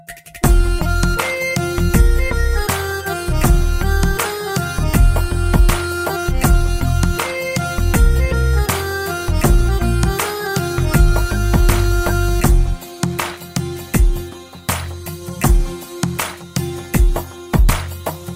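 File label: BG Music RingTone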